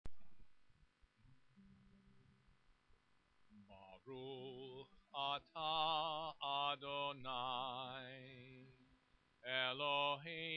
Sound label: Chanukah Candle Blessings